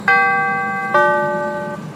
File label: CTA doors